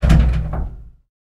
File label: turret klank; tanks; War Thunder